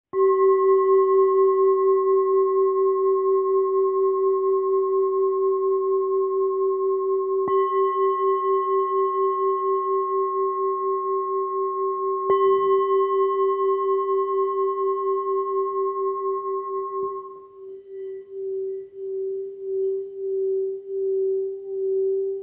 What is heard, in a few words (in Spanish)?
Nada fa sol 360 385, cuencos tibetanos